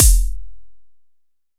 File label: kick layer